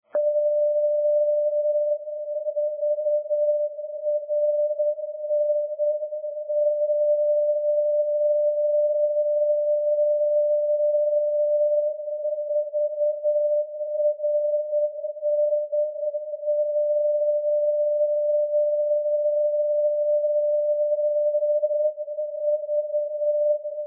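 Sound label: non-directional beacon; vranje vrn-337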